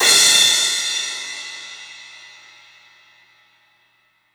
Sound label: CYMBALS; 3D Sound; 3D Drums